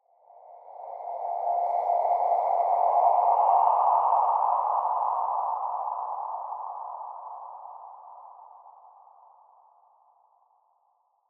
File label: Ghost Pass By; MinorHallutinationsSounds1